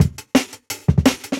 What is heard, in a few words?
170, Jungle Breaks; Dubble; Original Jungle Breaks